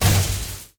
leg hinge; Apotheorasis

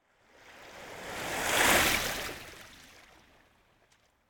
Surf Water Lap Small Wave On The Coast; Hollywood Edge; sound designer's toolkit v2

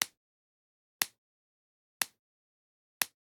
Generic Click Plastic Small

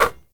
Street Ball Nylon Swish; SFX Kit

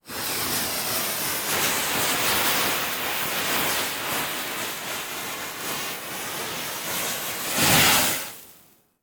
Fuse Black Powder; Sound Ideas; Guns

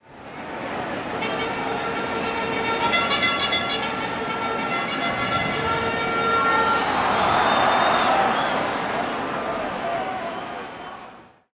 Baseball Crowd Charge; Crowds

